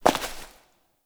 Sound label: Step Ice; Warframe; Koumei